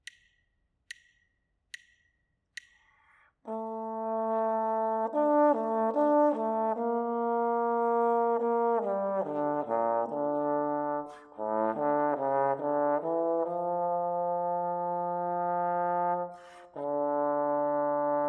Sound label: melodic error detection